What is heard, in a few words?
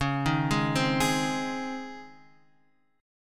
C sharp Major 7th Suspended 2nd, Guitar, Drop D